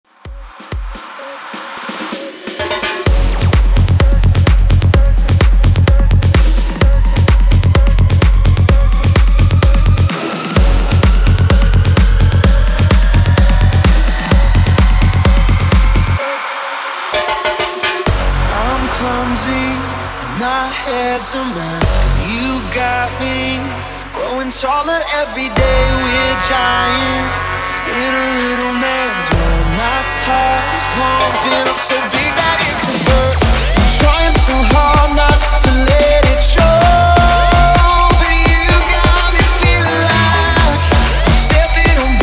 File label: MASHUP 128 BPM